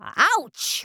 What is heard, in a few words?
card ouch; Deathground 1.2 Demo; New York; red (p2)